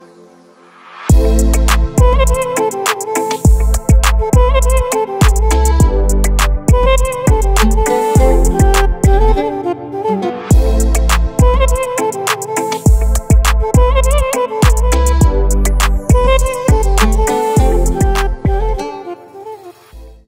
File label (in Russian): krasivyj instrumentalnyj tureckij bit na zvonok